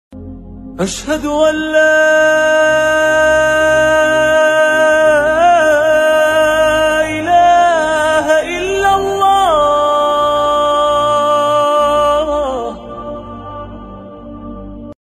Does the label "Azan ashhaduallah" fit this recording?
Yes